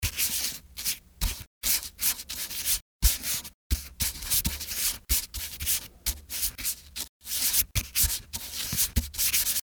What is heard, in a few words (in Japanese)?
fude